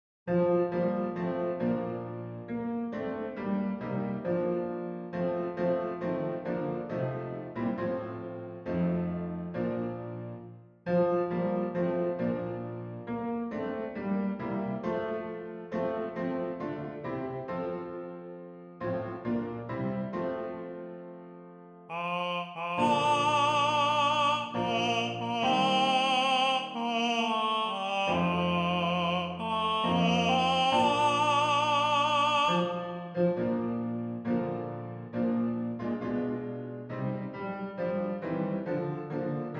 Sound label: Bariton